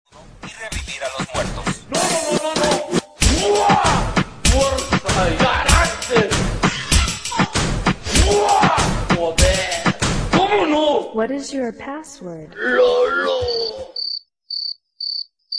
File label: fuaaaa, ringtones